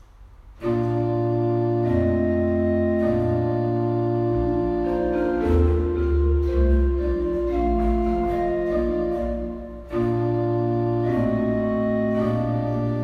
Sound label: larghetto; mueller